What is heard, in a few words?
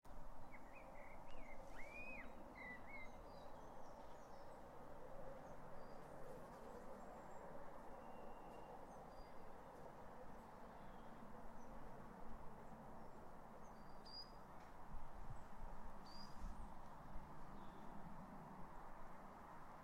sunday afternoon birds, includes birds and clock striking quarter past